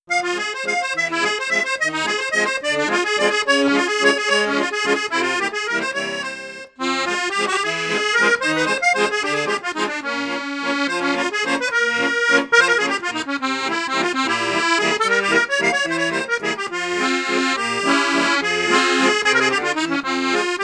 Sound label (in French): registre flute juste flute haute, accordeon; perle d'or